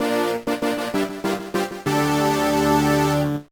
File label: Arena Victory; fire emblem 7 rekka no ken gamerip